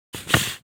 Plastic bag remove item; gfxsounds

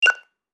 Shopping store checkout scanner single beep; shopping sound effects

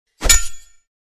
Medieval battle axe against sword impact

